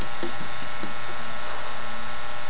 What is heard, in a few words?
Drums